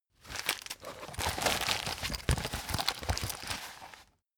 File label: plastic bag handle aggressive